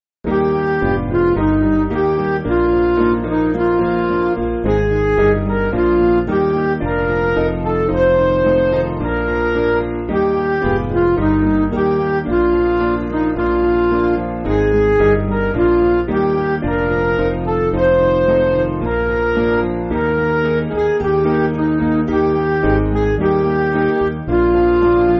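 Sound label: Midi, Music Backing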